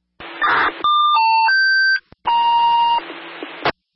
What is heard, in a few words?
Alert; HT1250